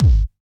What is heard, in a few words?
sharp kick drum single hit g sharp key, steel kick drum sound